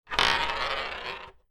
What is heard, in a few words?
marble single roll across table